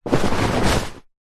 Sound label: foley clothing suit trousers pants pick up